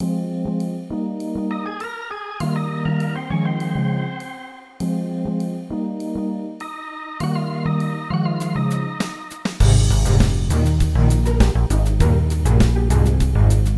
Thrice (Instrumental)

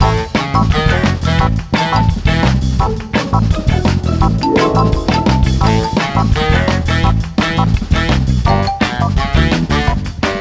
Lounge

